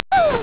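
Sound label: enemy hit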